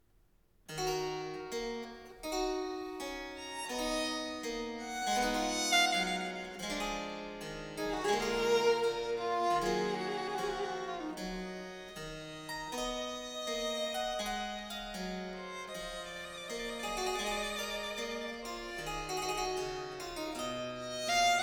sonata fur violine und cembalo largo; johann sebastian bach; bwv 1019a

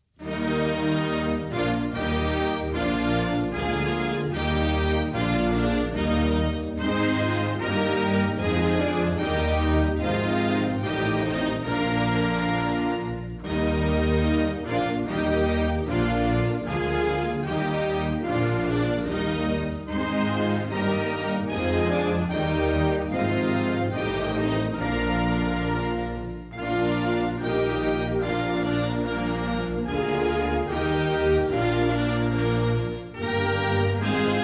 militarmarsche deutschland lied national anthem; deutsche militarmarsche

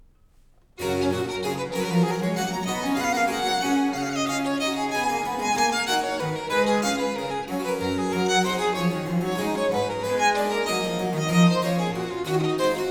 sonata fur violine und b c g dur vivace; johann sebastian bach; bwv 1021

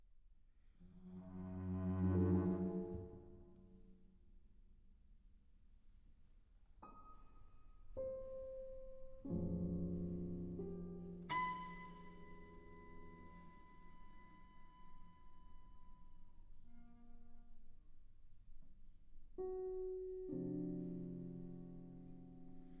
violoncello u klavier mabige achtel

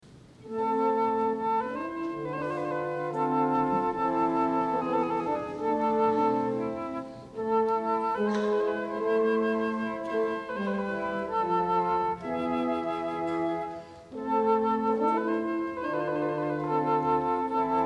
gavotte mit 6 variationen orch gavotte; jean philippe rameau

